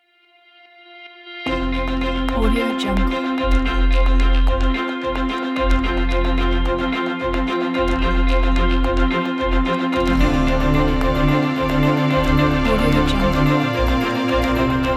indie pop

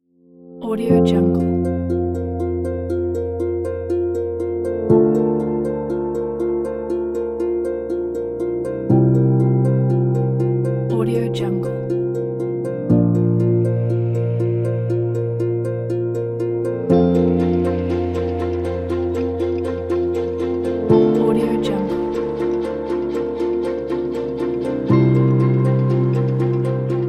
corporate background inspiration

